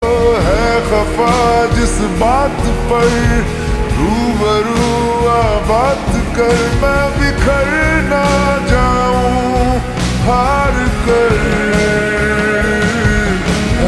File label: Ringtone Slowed Reverb